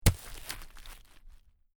Stone Fall Drop Rock On Dirt, Rock impacts with semi-soft d; Rock impacts with semi-soft dirt ground; Rock Sound Effects